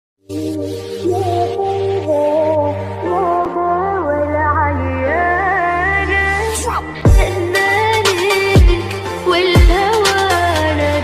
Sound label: islamic remix ringtone arabic ringtone islamic alarm tone